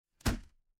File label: impact slam